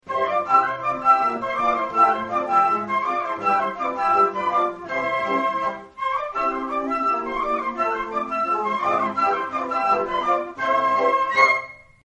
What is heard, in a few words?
concert, flute; AUTUMN concert 2016